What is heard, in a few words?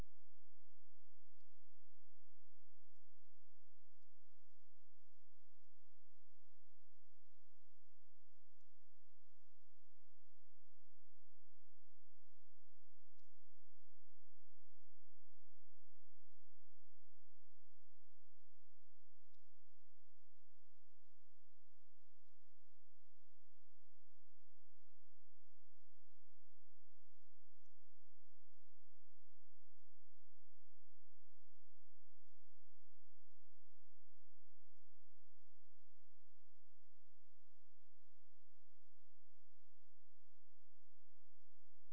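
hydrophone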